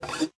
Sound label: GUITAR FX; Xsample VOL-2